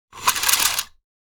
Throttle controller rope pulling fast

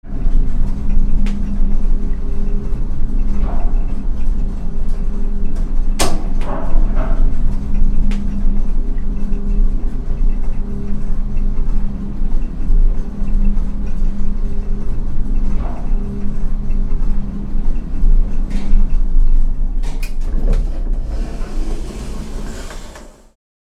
Service elevator moving hum inside perspective

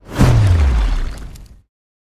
Superhero landing heavy

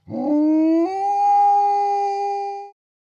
Alpha wolf howling

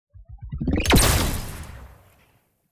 Sci-fi weapon neutron cannon hitting

